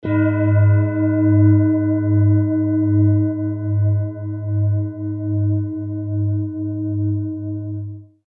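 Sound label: tibetan bowl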